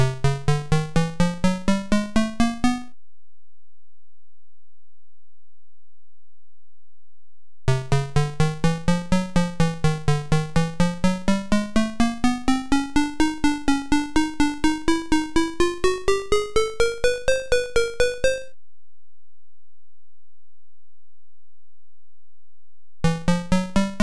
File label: Cromatic Scales